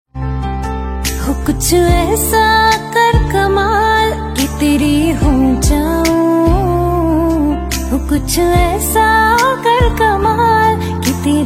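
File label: song female version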